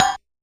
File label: Realistic Concertmate-670 Kit Hat; One Shot Kit